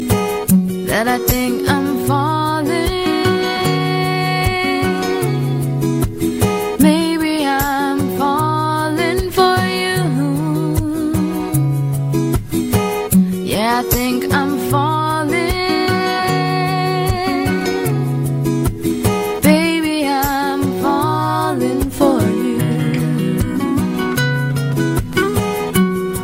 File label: mmmmmmmmm; rnb